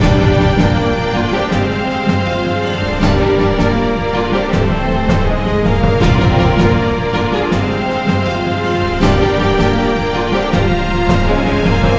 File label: orchestra, bgm; pictcode